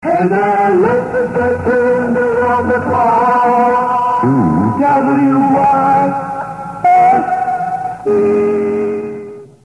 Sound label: jingle; WYSE